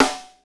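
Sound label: snare funk, Roland; Northstar - Drumscapes Roland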